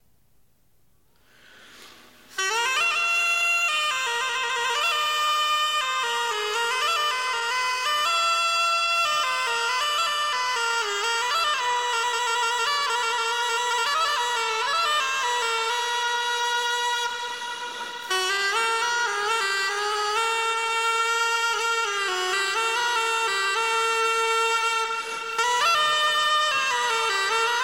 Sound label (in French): cornemuse